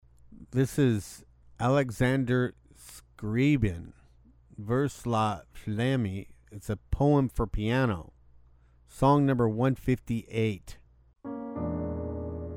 poem for piano; Alexander Scriabin; Vers la flamme

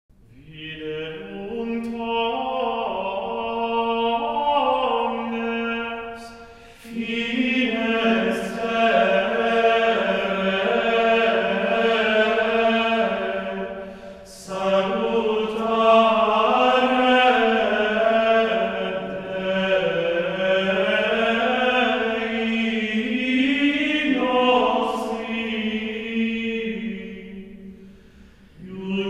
Gregorian chant Mass, Gradual; Mass for Christmas Day

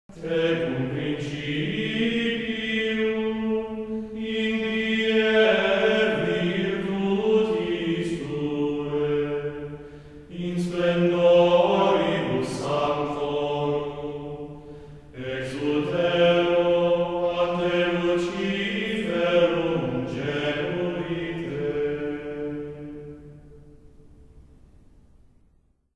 Antiphon Tecum principium and psalm Dixit Dominus

